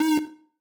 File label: synth